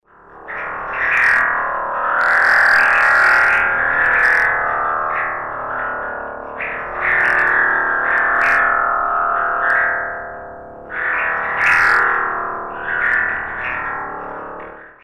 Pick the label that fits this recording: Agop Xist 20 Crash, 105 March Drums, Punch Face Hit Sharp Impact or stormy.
stormy